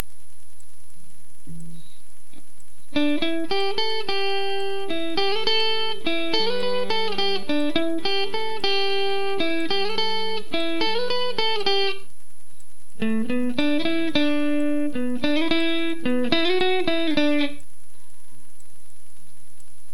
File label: melody